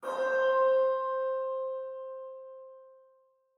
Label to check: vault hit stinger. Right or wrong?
Wrong